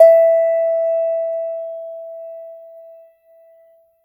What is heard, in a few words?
a clean dry audio recordi, minimal resonance